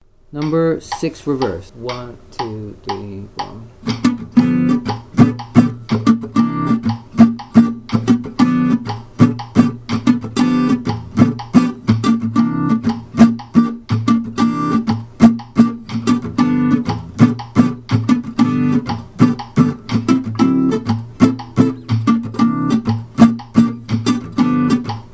claves original clave comping 12 bar blues reversed